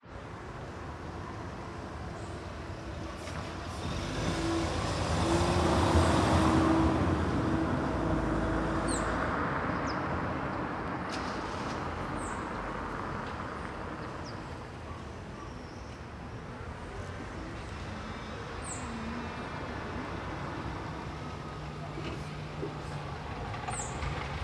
Carros passando em Quebra-molas de Quadra Residencial da Asa Norte

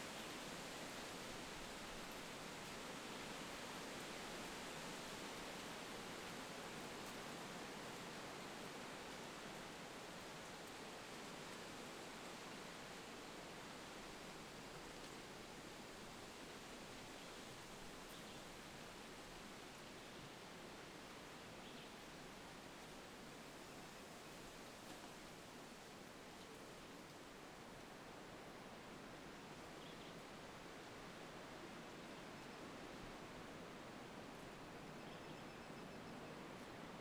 Vento folhas queda de agua pequena passaro

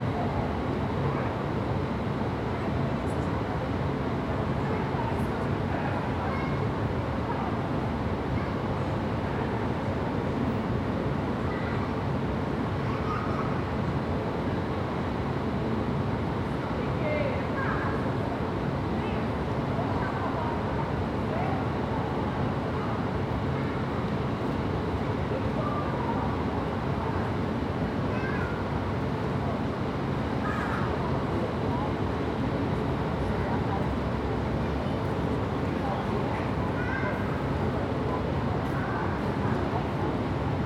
Ambiencia rodoviaria de goiania a tarde alguns passos, mulheres e crianca; voz feminia

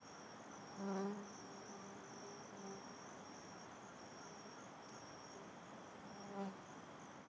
Mosca Sobrevoando Microfone em Ambiente com Rio Ao Fundo; Cerrado; insetos; colecao sonora do cerrado

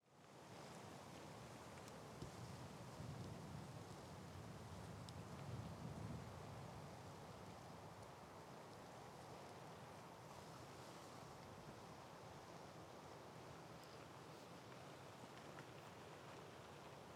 Vento Fraco no Alto do Morro da Baleia Sem Vegetacao; canais mono

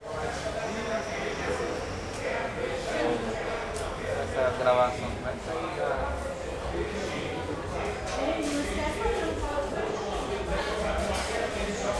Feira do Paranoa com Anunciante da Peixaria ao Fundo e Vozerio mais perto; Cerrado

